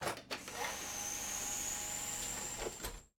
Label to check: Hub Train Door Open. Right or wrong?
Right